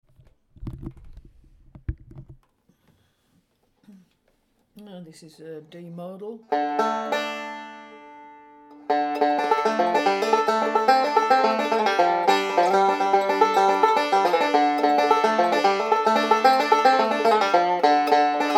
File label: d modal, banjo; hopkins; walnut deluxe